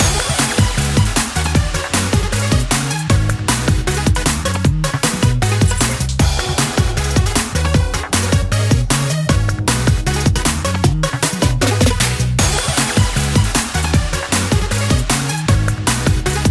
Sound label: win; Astral Party Demo